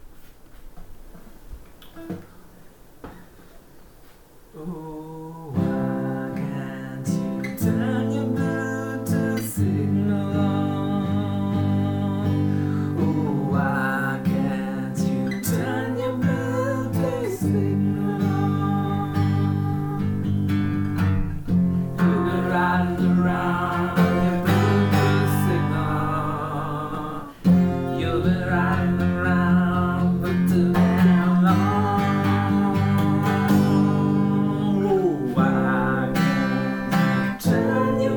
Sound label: BLUETOOTH SIGNAL OFF